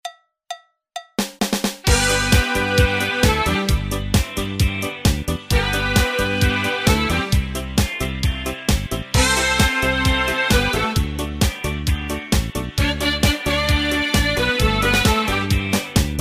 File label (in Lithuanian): sax solo